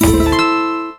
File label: Win Line; MexicanaChillitime; ClubFusion